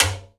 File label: piala, timbales; E-MU Producer Series Vol. 2 – More Studio Essentials; Composer; Timbales Xswitch